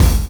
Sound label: Kick, drums; Sonic Foundry (Sony Creative Software); Drums & Drones; Construction Kits; Sludge Kit